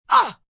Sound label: Grunt Breathy Ah, Grunts, groans, impact, female, short; Groaning